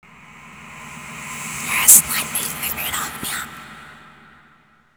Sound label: reversed; slender